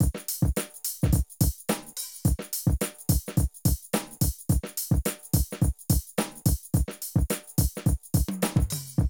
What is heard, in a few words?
Drum loops, Streetsoul